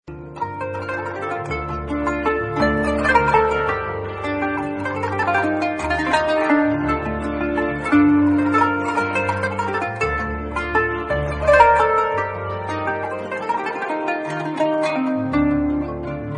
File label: turkish qanun